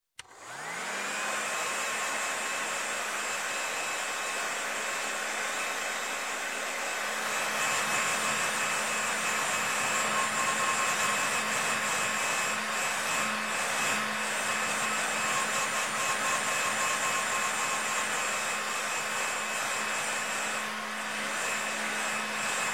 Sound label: Hair, Dryer Drying Hair, Barber Shop, Hair Salo; Hair Salon; Sound Ideas 2000 Series